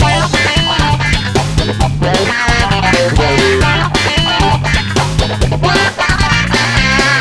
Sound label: rock, bgm; pictcode